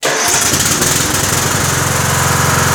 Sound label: Engine Start; Generators